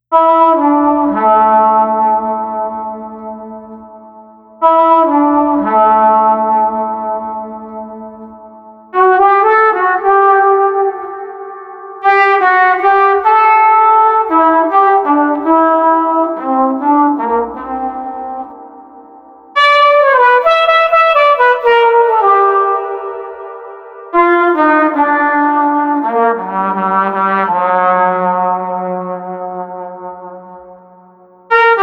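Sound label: Street Flugelhorn Melancholy, 120 bpm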